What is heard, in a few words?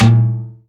Foley Pan Tom; essential drumkit